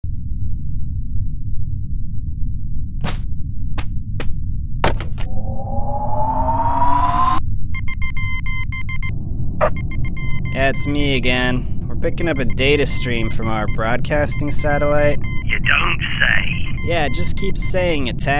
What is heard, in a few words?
Broadcast Satellite